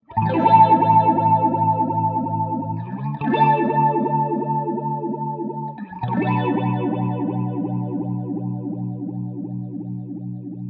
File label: Elect Guitar Vibrato, electric guitar